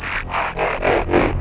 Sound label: Minimize